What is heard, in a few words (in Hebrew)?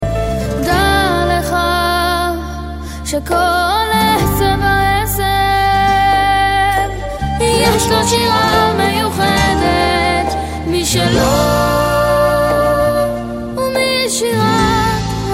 רינגטון